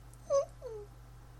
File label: squeaky